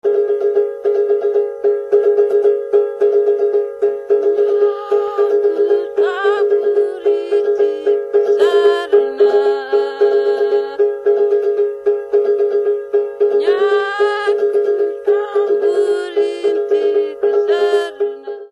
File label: string